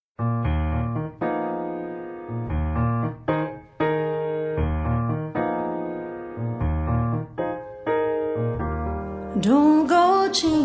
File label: Acoustic Guitar